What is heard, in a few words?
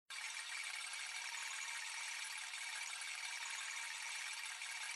Reel Spin; slots; Christmas Blast